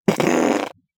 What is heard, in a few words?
long fart on plane